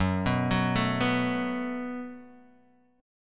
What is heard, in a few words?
Gb Minor Double Flat 5th Gb, Guitar Open D; 4,2,4,3,2,x; down; chord shape